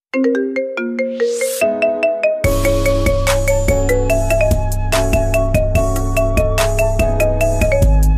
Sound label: ringtone; apple iphone 5s plus